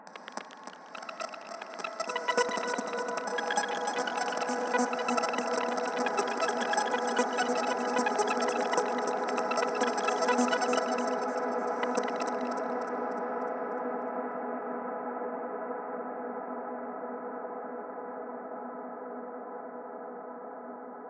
glitchy melody pad thingy

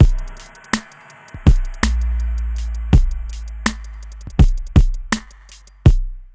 BEAT, Hip Hop R n B; USB Soundscan vol.19; AKAI

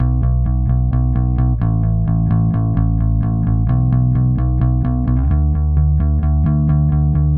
Bass, Indie Rock; Sonic Foundry (Sony Creative Software); Indie Rock Anthems; Session 10 - Roswell

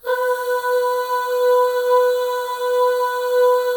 AH FLAIR, FEM; Spectrasonics; FEM.2 AHS

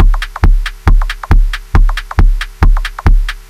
break noisy Techno

